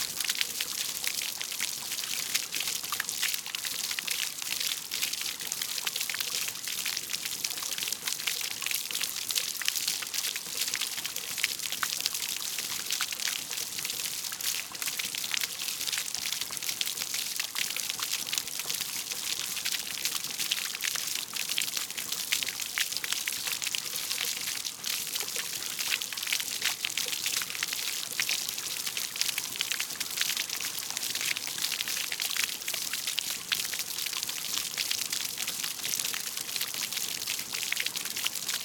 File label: FOUNTAIN Small, Splashes of Multiple Squirts on the Ground, Close; Articulated Sounds; 100 Fountains